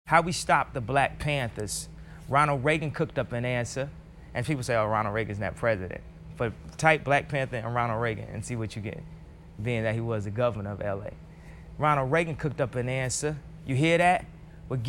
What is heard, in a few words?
Acapella